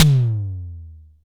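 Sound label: SDS TM, tom; Best Service; Real Mega Drums VOL-1; SDS V KIT 2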